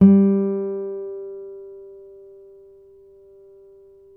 NYL G, FLAMENCO NYL

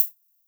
HH, HIHAT

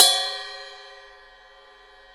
Rock Ride Bell up; Digital Sound Factory

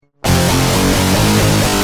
Guitar Rage, Industrial

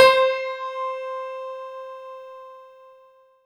C5, Synth Line; 90 s Sample CDs; NiceBeats; SynthLine VOL-23

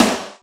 Ouchi Snare, Drum Machines Roland R-5

